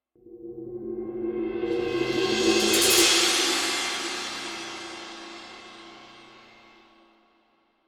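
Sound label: Crescendo Cymbolic; Brand X Music; Brand X Music Catalogue - Editor's Essentials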